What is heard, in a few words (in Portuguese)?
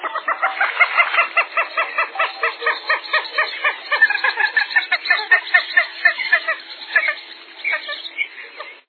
jacana ou piacoca